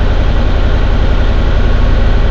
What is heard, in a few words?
55 AMG komp, Motoren; World Racing; XBOX